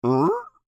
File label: Creature Human Moan Intrigued; Groaning; Voice; Big Room Sound